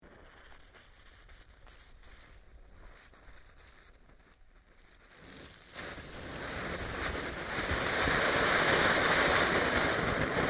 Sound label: Fireworks Fountain, Variety of fireworks being detonated